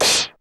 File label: KRG DDM1 CYM; Hollywood Edge; The Big Bad Beatmachine; DDM-110